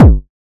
tight kick sound g sharp key, Royality free kick drum one shot tuned to the G# note